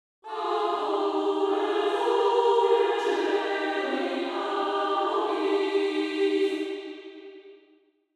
Angelic choir angels a chorus of angelic harmonies